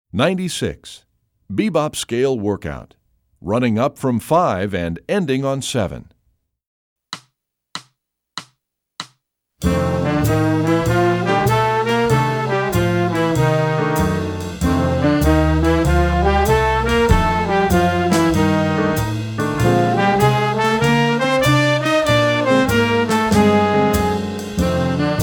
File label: bebop scale workout running